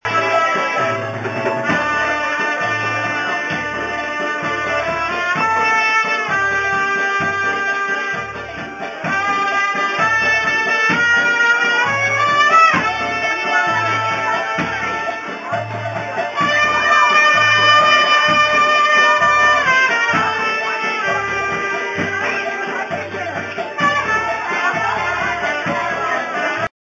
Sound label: polka